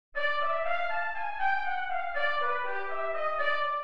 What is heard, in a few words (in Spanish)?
Maj7 inv0 en C